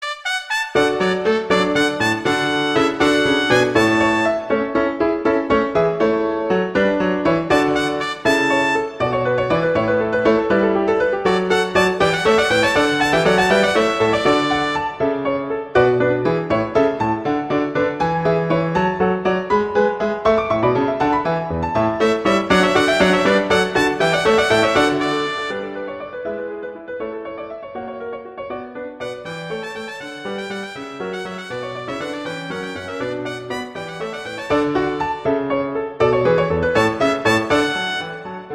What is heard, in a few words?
Allegro; Torelli; Sonata